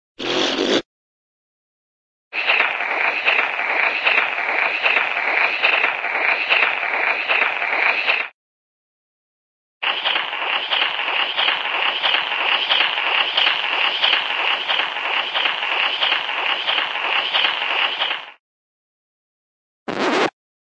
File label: razzberry, cartoon big razzberry